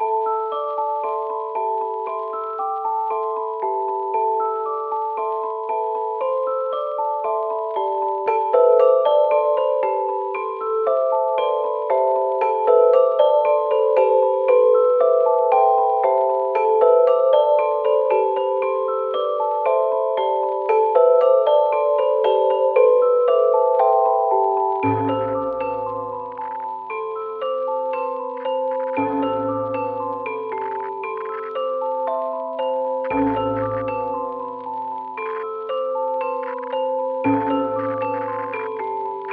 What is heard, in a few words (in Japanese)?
迷 loop